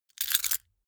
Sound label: key ring large grab